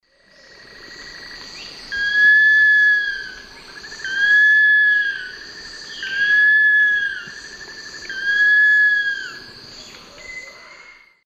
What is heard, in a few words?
Nasica longirostris, song; S. America; Eastern Lowlands